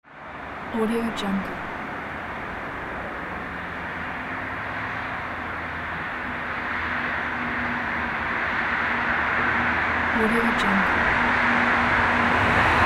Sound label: city evening ambience